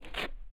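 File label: gritty cement shuffle, footsteps; chief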